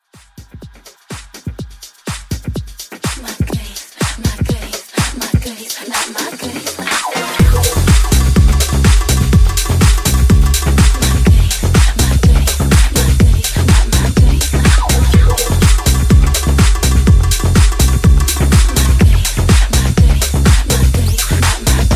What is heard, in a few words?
Club House; 2024 Club House Edit